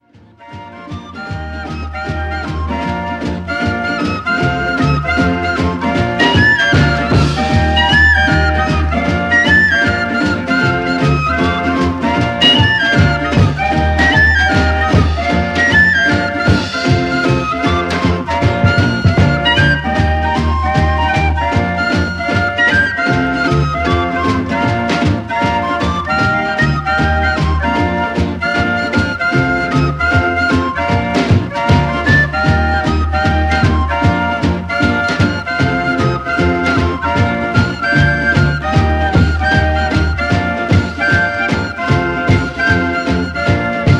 Flute Quintet

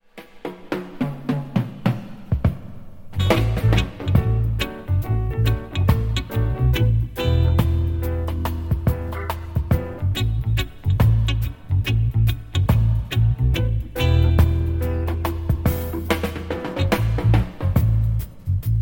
Into Drum & Bass

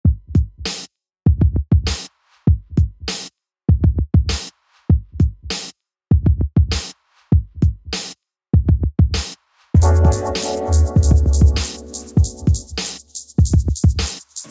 beat

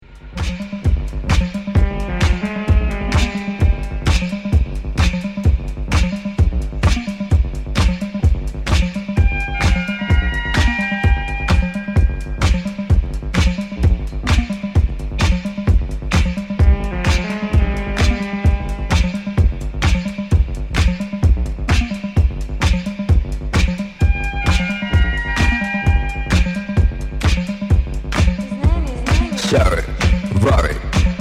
Instrumental

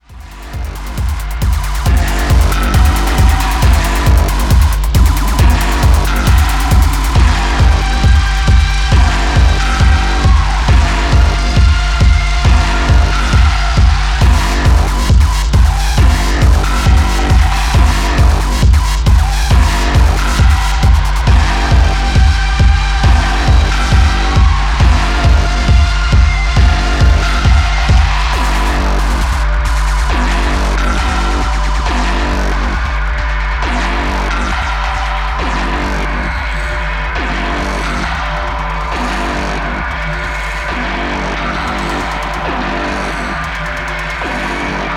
Drone Button Pusher

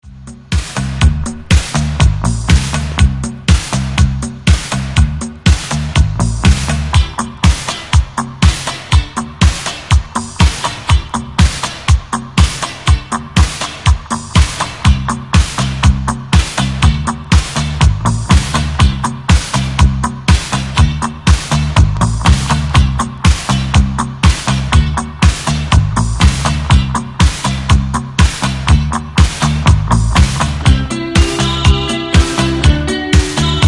Instrumental

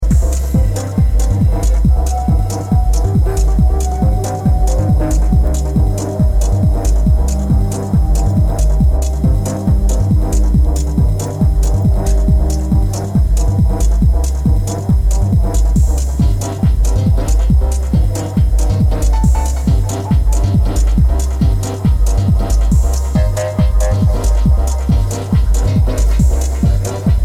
Roomtone; Roomtone 1

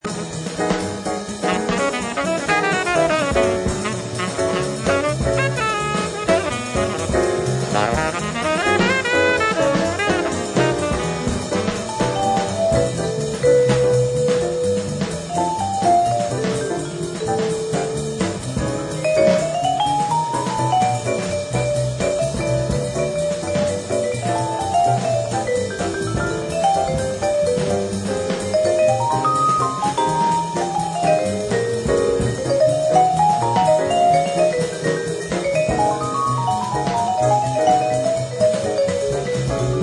beat

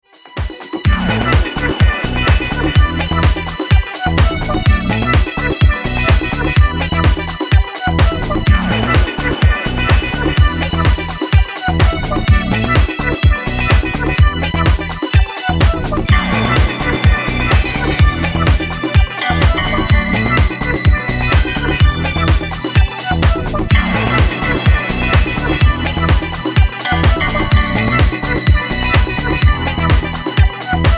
Instrumental

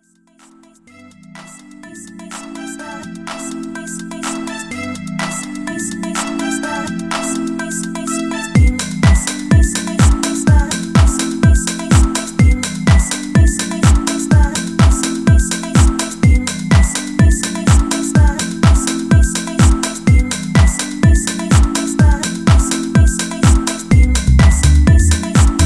Magic Flute

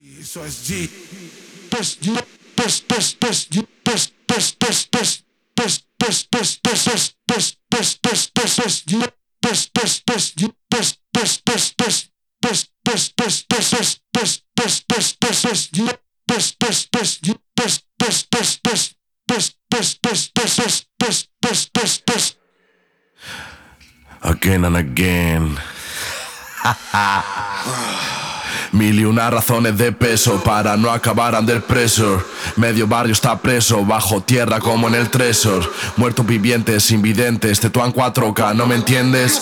ACAPELLA

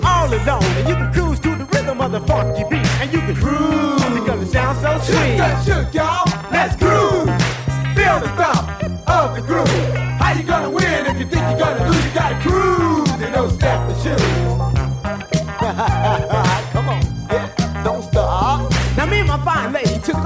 Rap (vocal)

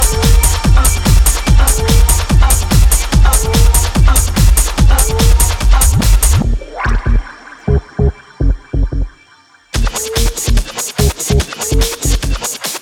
Funk Solo

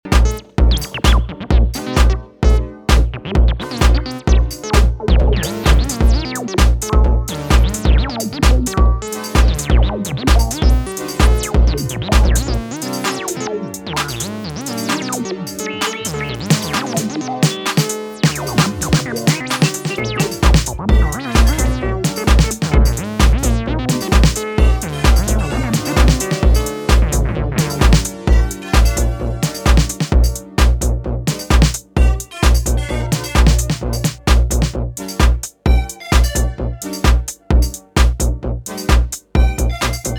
Techno

